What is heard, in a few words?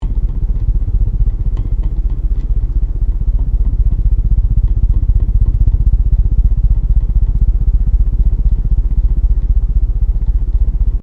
FAN CEILING BROKEN ABOVE LOOP; Various fans; Sound Effects:Electronics